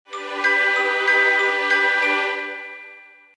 Win Jingle; Adventure Escape Mysteries; Mystery Revealed

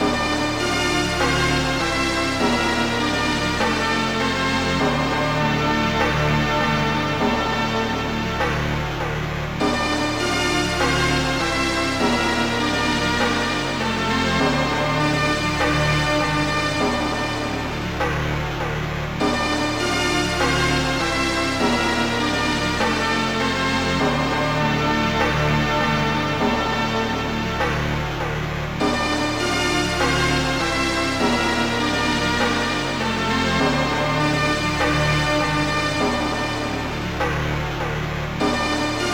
Door Open (Song); Bionicle Matoran Adventures

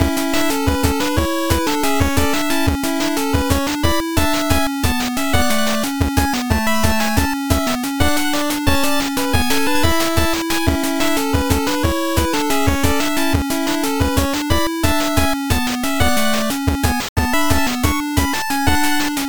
8bit; Hina-chan Maize Tower; Toho RPG; Android

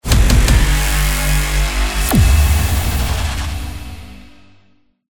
Level Up Big

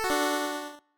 hero chord

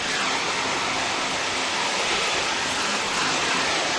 Aspi Loop

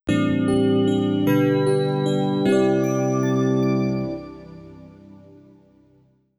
Sleep

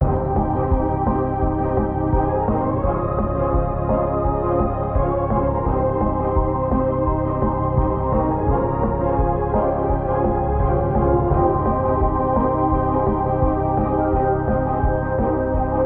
Fateful Battle Muffled Loop; Niko and the Cubic Curse; Xbox Series X/S

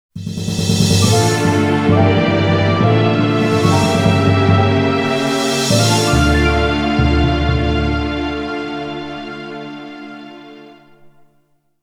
Defeat; Jeanne d'Arc

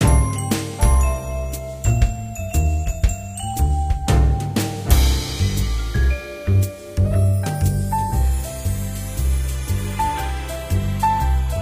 Main Menu Music; Diner Dash Seasonal Snack Pack

